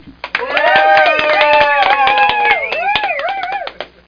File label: cheering; Softdisk for Windows 43